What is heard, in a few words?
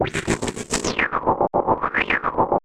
WIGGY WAH; Distored Reality